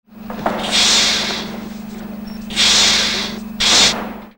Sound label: door hiss open close; Airwolf